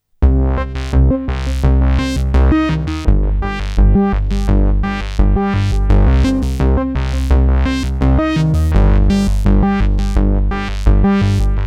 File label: matrixbrute2 bass arp